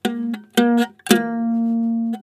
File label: Badly tuned guitar; SFX; 7rystan's 600+ FREE EDM SAMPLES & DRUMS